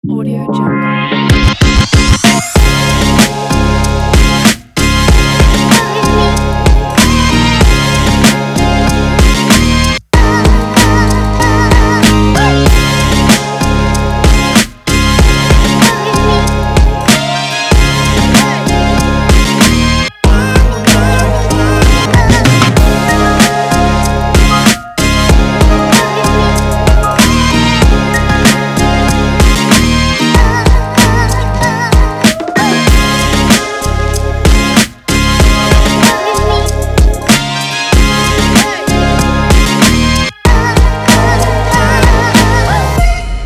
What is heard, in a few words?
this is hip hop; glowcity